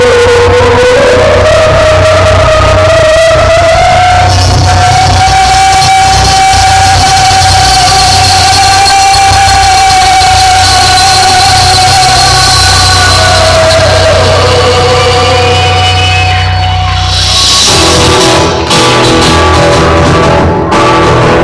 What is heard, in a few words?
Screamsssss